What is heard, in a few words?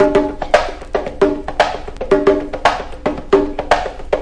113 bpm, compressed bongos